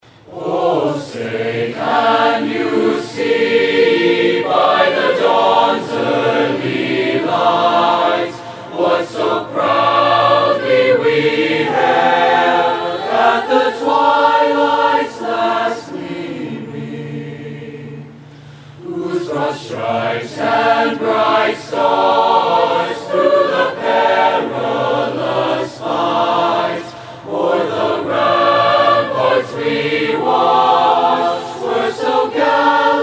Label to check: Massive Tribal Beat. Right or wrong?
Wrong